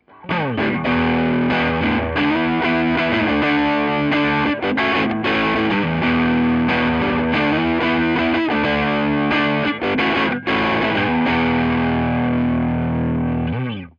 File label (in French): tele medium sature